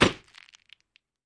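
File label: Flashbulb Pops With Glass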